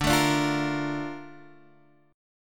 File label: A sharp Major 7th